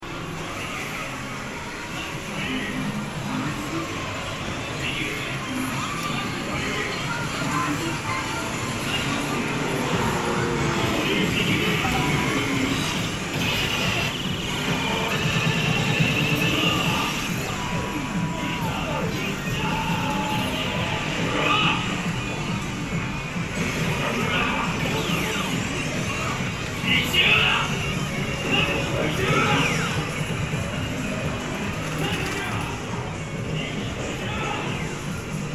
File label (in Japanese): スロット店内の環境音